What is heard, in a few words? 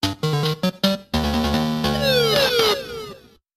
Game Over